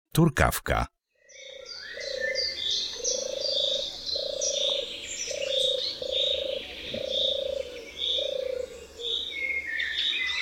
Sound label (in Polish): TURKAWKA